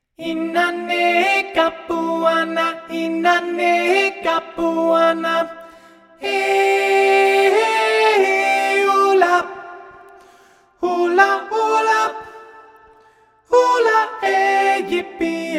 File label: inanay (soprano)